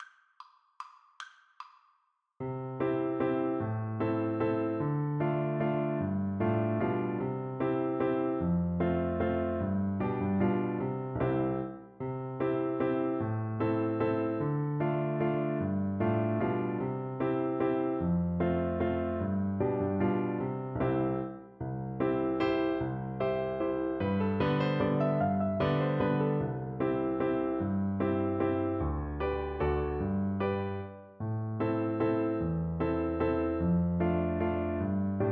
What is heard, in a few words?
beauty in tears flute